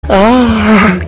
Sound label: aaahhh